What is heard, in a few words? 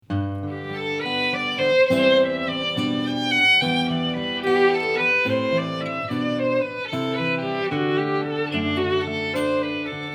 violin and guitar